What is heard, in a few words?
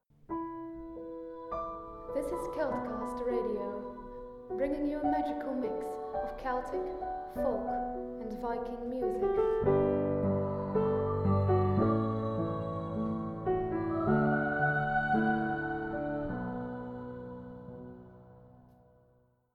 jingle; Imbue